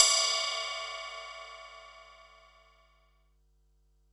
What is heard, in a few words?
HH, Pearl SC-40 Hihats and cymbals